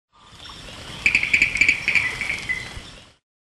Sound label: Spinomantis phantasticus